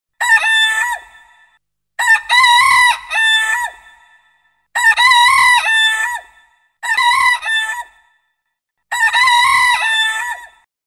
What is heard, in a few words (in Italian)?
Canto del gallo - suoneria; italiano; Emblemata; Musica